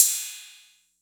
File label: Cymbal 808; Tone11; gate experiments